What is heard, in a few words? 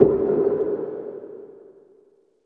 hurt; svencoop; ghouls forest; uboa